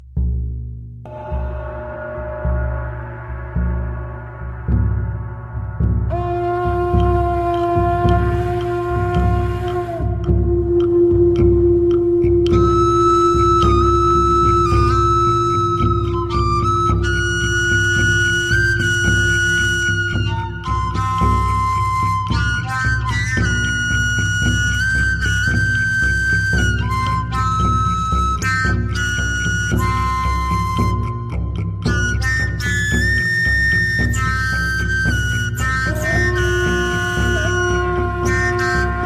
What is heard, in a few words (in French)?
musique chamanique tambour